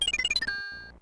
level clear or open inventory; smas-smb3

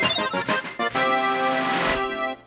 high score

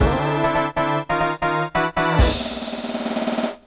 final lap

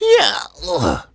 yawn; smsunshine; mario

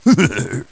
laugh; bowser

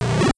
enemy hit; smb2

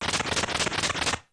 hammer bros shuffle; smb3

